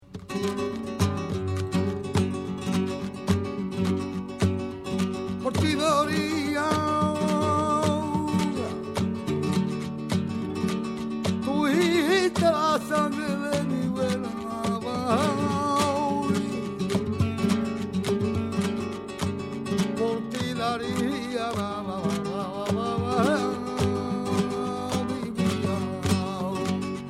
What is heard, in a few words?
tangos, flamenco